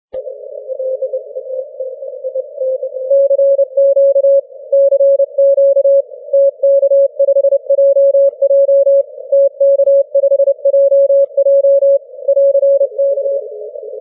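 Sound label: 6m Morse code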